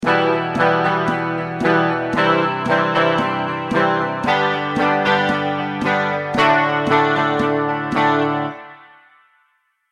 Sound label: chord progression em c g d, electric guitar